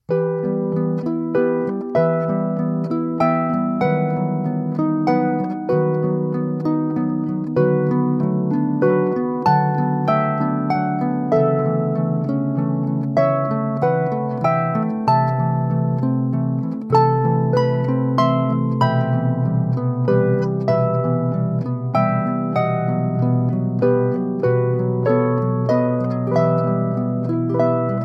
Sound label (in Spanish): Arpa, ringtone